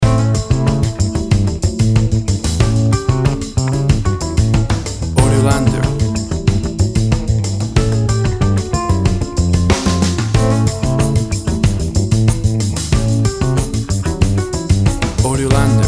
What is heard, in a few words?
Tropical Acid Jazz Music